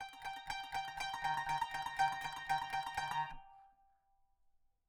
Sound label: kanun